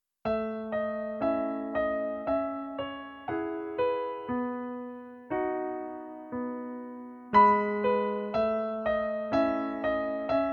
Pianoplayback in E Dur

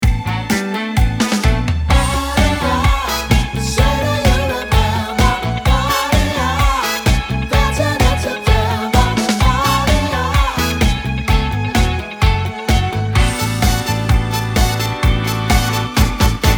A ohne PIano

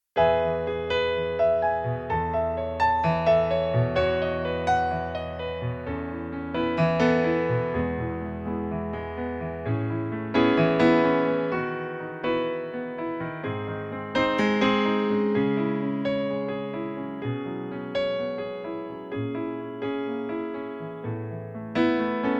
pianoplayback E Dur

